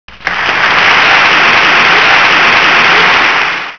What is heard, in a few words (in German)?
applaus